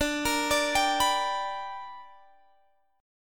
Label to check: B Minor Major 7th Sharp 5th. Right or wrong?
Right